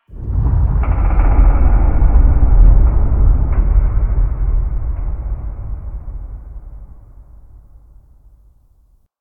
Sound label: Cave Underwater Rattle; Ambience; Warframe; Stalker Cave